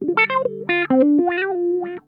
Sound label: SKIN DEEP, Funk Guitar; 90 s Sample CDs; Zero G